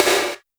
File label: snare, Roland R-70